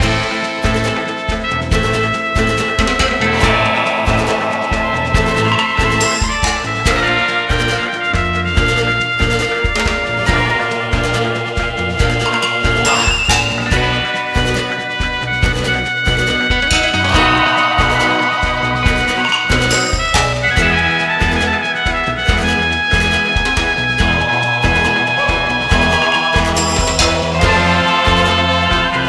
music freespin loop; netent

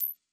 count up; netent

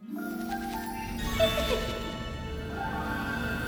feature Splash Start; netent

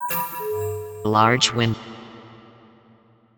win Large; PIXIGSLOT; netent

